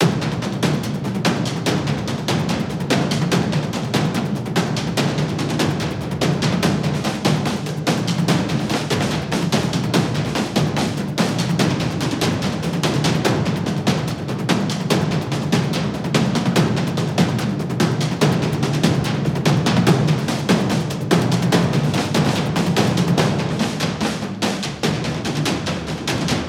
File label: Loop Segment; Valley